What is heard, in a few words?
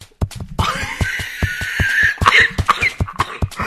Wheezy Laugh; Dashie